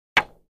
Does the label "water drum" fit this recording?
No